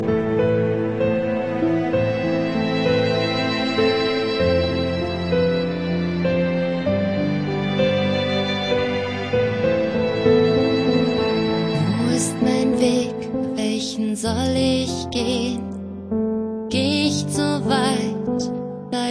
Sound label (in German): Orchestralversional; Infernosounds